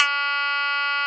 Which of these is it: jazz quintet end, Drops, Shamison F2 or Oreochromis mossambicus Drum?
Shamison F2